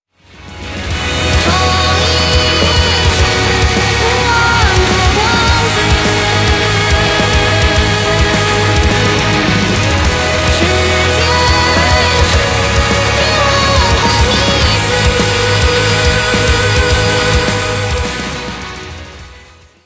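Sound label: background music crossfade; Invaxion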